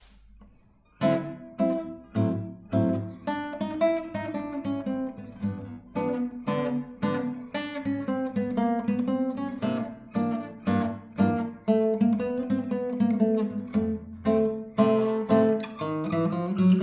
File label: Lick